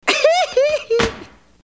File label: hee hee heee